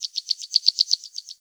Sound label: Forest Open Glade Bird Close; Ambience; Day